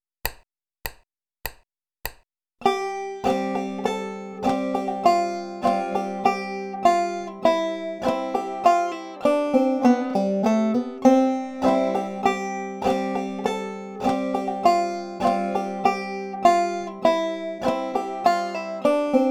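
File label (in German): mit hammer on und pull off, banjo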